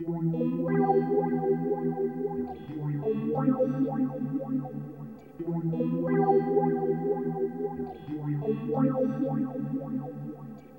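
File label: guitar, D, Hip Hop & RnB; ProSamples vol.34 - Hip Hop & RnB 3; Phased Out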